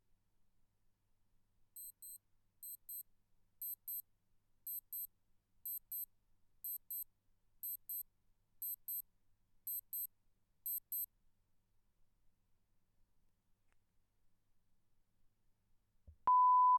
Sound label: Digital Watch